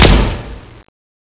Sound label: gun fire; weapons